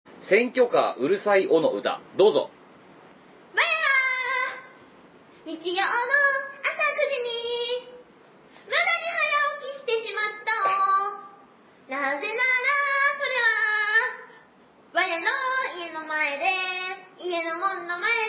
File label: noisy senkyo car